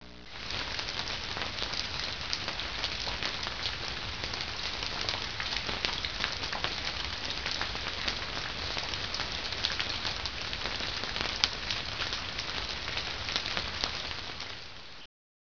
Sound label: raining